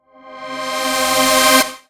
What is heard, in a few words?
Reverse Synths Cmin; Effects; Vengeance; Essential Clubsounds Vol.4